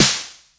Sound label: Snare, retro house samples, Drum Hits